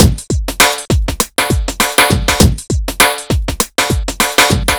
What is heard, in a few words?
tech beat; Sonic Foundry (Sony Creative Software); Ugly Remnants VOL-2